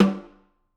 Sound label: Snr Off, drum